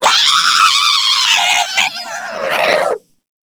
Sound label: dying; scarlet; slender